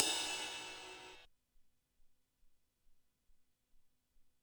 Cym ride, Drum Machines, ELKA X1000; 300 Drum Machines

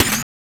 SD, 130 BPM; Ueberschall; Drum & Bass Flash Trackz

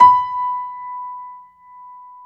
PIANO B4; AKAI S-Series CD-ROM Sound Library VOL-8

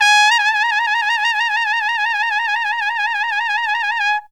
TRUMPET FX, Phantom Horns; Zero-G

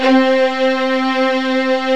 SPARCO VIOLIN

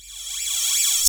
Cymb FX C3; Club Techno

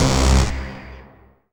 RAVE, RAVESYN

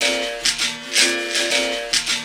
LOOP L, Drum & Bass 160 BPM

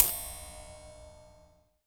Ride Bell, World Percussion One Shots; Sony CS

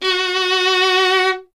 STR VLN, Vln Detache; Miroslav Vitous

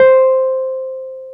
Wurly; Keyboards of The 60's and 70's

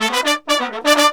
120, BRASS SAMBA; Best Service ProSamples vol.14; AKAI

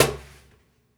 Cajun, Percussion One Shots; Essential Percussion

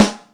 Brysn H, Yamaha DTXpress Acoustic Snare

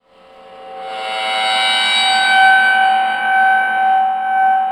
BRONZE FEEDB; Rarefaction; Poke in The Ear With A Sharp Stick

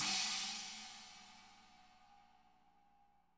CHINA 20, CHINA CRASH; ILIO; Double Platinum Drums 2

